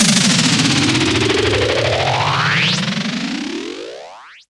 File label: FX HIT, Techno Trance; Ueberschall